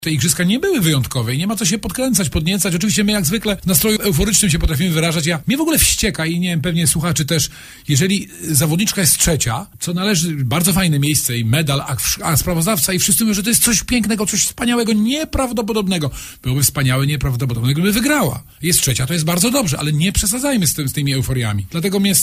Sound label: flugel